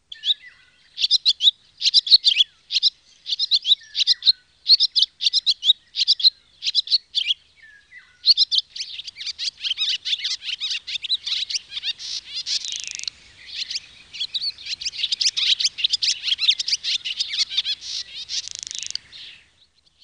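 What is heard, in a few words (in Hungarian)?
Füstifecske; Madarak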